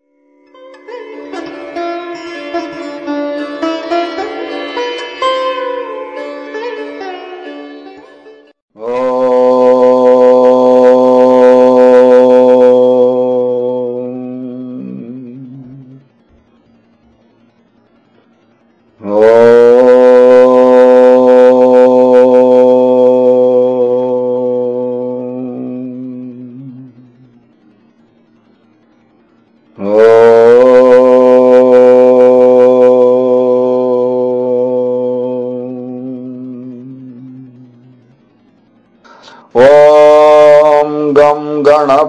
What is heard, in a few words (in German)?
Mantra OM; Mantra Manjari